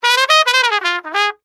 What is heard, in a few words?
Trumpet Solo; rocktopus